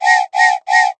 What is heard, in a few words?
factory alarm